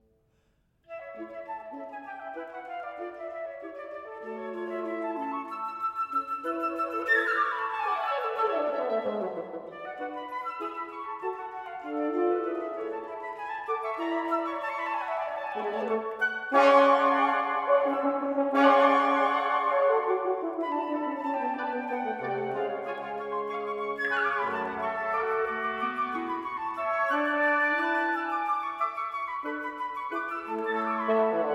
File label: Quintet for Flute Oboe Clarinet Horn and Bassoon; Variation II; Un poco di più